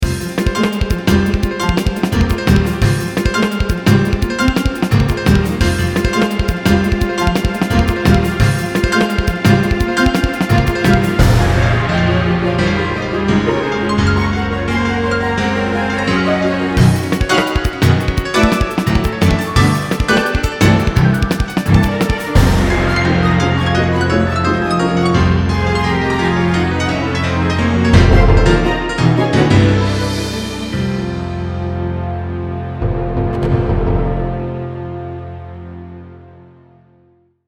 tense situations

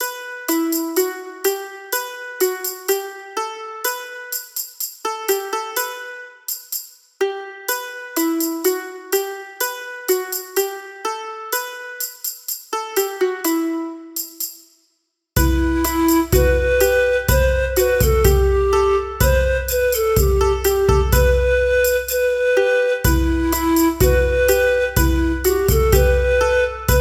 peasantry

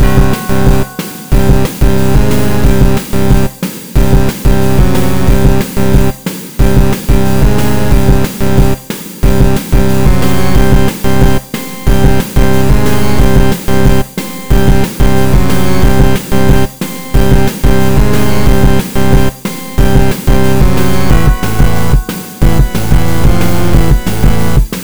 Boss Battle

